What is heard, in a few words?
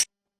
sound ui click